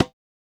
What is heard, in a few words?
tabla; foxdot default